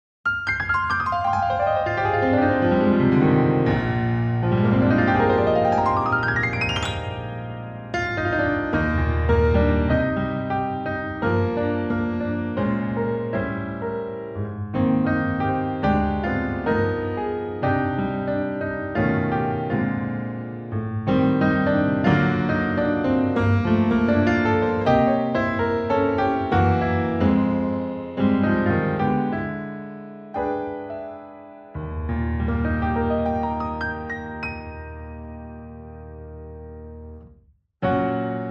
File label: Blue Grand